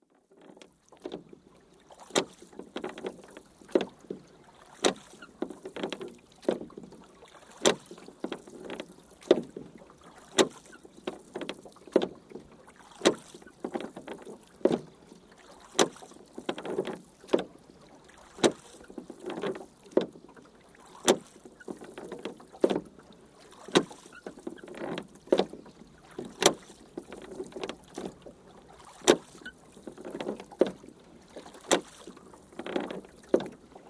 Rowing Boat, constant rowing (Recorded on board) (One man rowing); Boats; BBC